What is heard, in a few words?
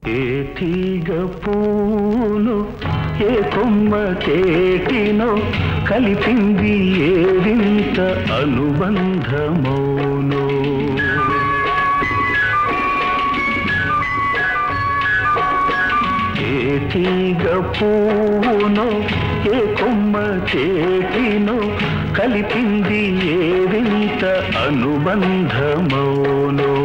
Male; Maro Charitra